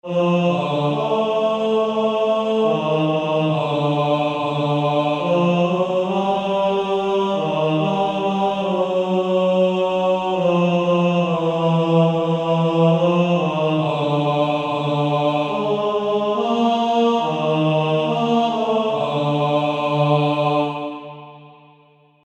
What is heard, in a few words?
Male Choir; Jesus Calls Us